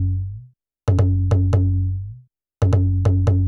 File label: TABLA, Techno 138 BPM; Best Service ProSamples vol.54; AKAI; DAVE CEE